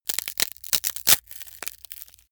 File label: wood sticks small crack long